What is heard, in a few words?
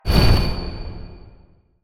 UI Earth Select; User Interface